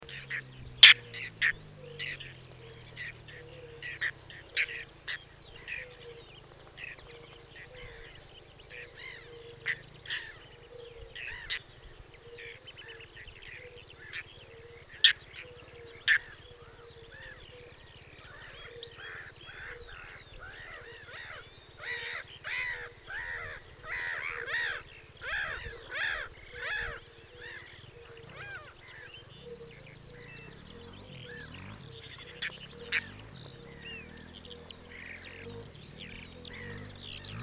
beloschekaya krachka golos

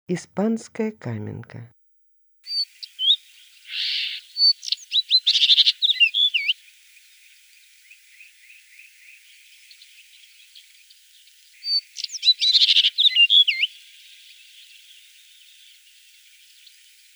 ispanskaya kamenka golos

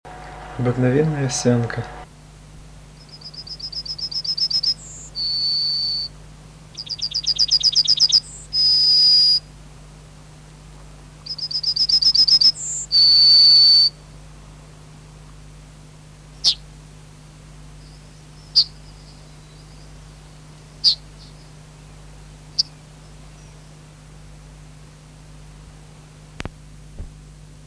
obyknovennaya ovsyanka golos

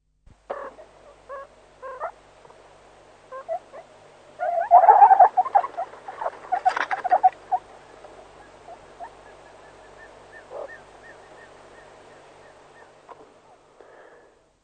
surikat golos

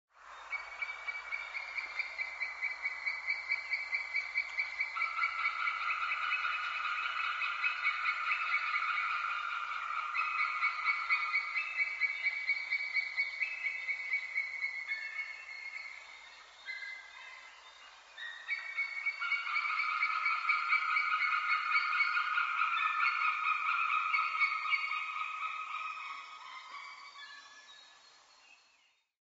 orlan belohvost golos

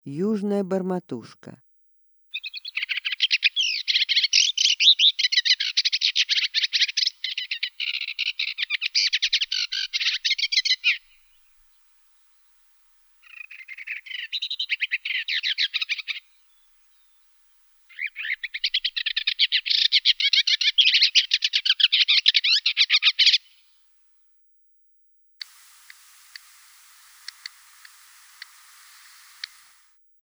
yuzhnaya bormotushka golos

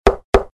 zvuki stuka

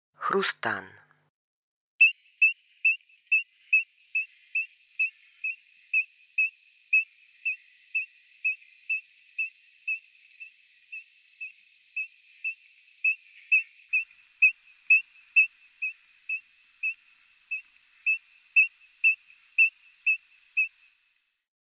hrustan golos

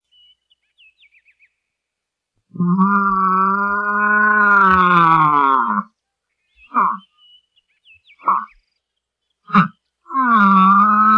losi golosa

